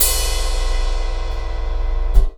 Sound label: Cymbal; Percussion Samples